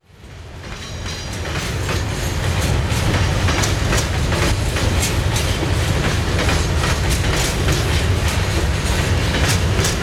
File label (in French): bruit joints de rails; sonotheque